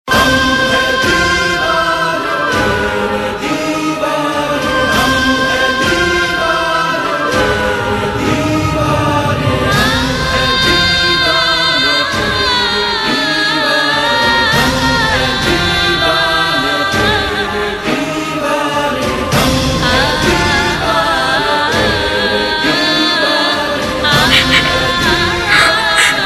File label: Sad Tune; TV Serial Tone; Colors TV; Madhubala - Ek Ishq Ek Junoon